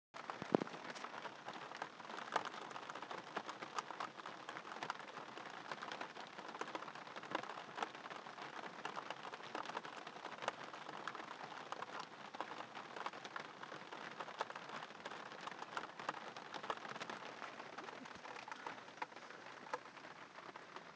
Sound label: rain in torbay; channelswimmer; swimming